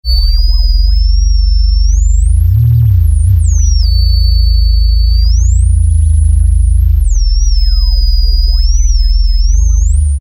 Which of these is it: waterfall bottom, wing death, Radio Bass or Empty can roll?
Radio Bass